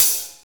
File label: studio pedal hi hat single hit f sharp key, Royality free high-hat tuned to the F# note; hat samples